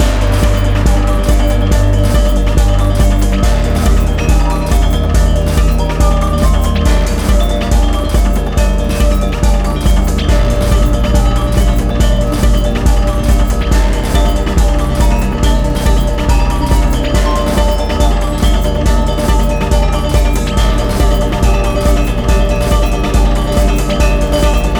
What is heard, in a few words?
Gamelan techno Medium; rive wreck hack die retry; ps4